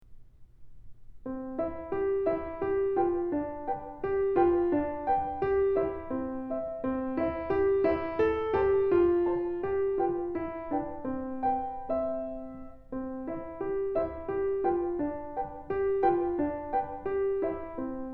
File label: Polka; Keith Snell Level 00 Romantic 20th Century